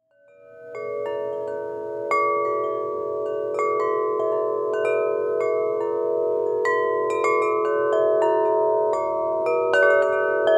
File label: Chimes; SummerSongfest